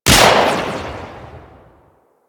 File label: Cowgirl Pistol 3D Shot A; Tenno